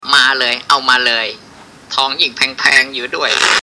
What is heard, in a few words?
cartoon